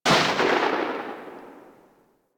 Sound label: Spark Rifle Shot End; Grineer